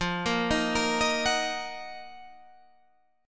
Eb Minor F; Ebm/F arpeggiated

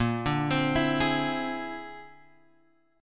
Bb 9th Flat 5th Bb down Guitar Open G

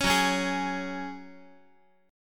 F sharp Suspended 2nd, Guitar; strummed; down; 4A,4E,5A,5D,5Gsharp,6Csharp

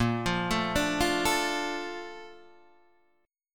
A sharp Major 7th, Guitar DADGAD

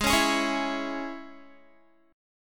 G sharp Suspended 4th Sharp 5th G sharp, Guitar; strummed

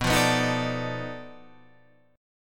B 7th Sharp 9th Flat 5th, down, guitar; B7#9b5 strummed; JGuitar

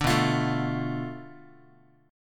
B Minor 7th Flat 5th, Guitar; Bm7b5 strummed; 5,7,8,7,x,x; 4A,4E,5A,5D,5G#,6C#; JGuitar

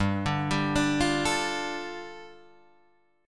G 7th Suspended 2nd G, Guitar Open D

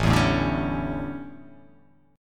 A 11th A down, chord; strummed

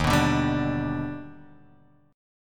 Eb Augmented Major 7th Eb down; Eb+M7 strummed; JGuitar

